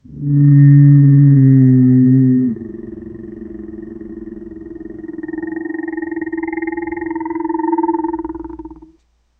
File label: a prehistoric apex preda